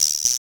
CR-78

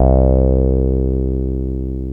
TB BASS; TB-303

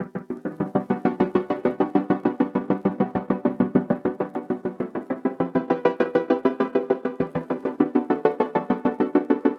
C7 stutters, Music Loops; Touch Loops; BlissedOutBeats

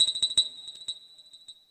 FX Industrial 140

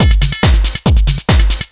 TECHNO BEATS 140

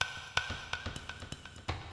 bottle, ethno, 125

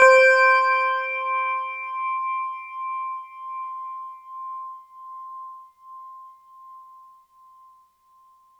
C6, Chimes; Orchestral; FL Keys

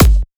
kick, drum hits; Anthemic

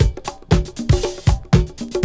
SAMBA 118, loop; 115-121 BPM

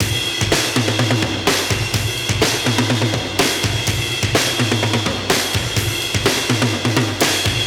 125 Classic Breaks, 125; Touch Loops; ClassicBreakSessions

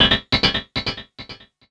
KEY SHOT TEKNO 140 A; Techno2; Singleshots

